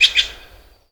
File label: Rand Bird; moul